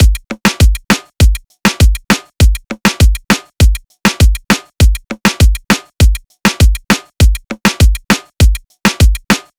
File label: Drum Loop - 100 BPM (Latin); Cymatics; PARADOX II; Drum Loop Collection